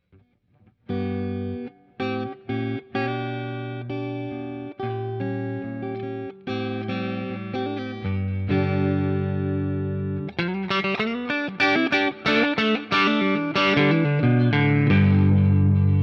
strat blade western

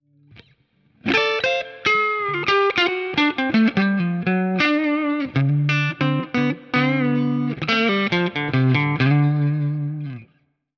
Cort SP90 Solo

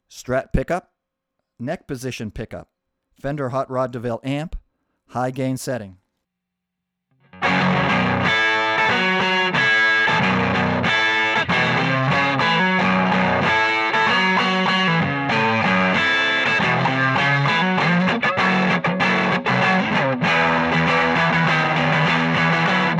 strat neck hot high, guitar

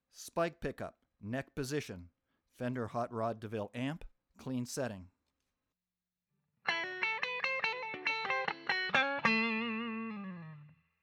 spike neck hotrod clean

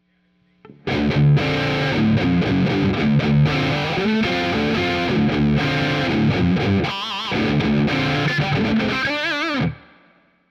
H540 Bridge Gain

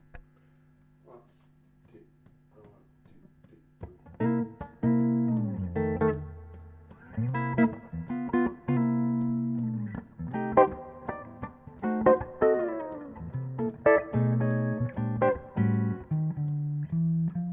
Pickup C Blues jam, guitar

